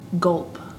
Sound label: gulp